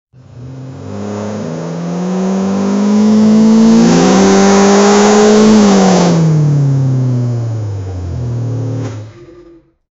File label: gt3 car with a twin turbo; sportscar